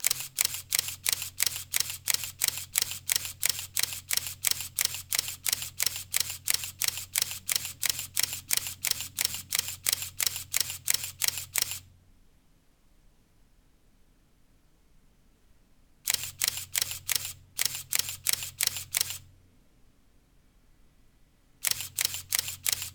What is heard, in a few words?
canon eos 400d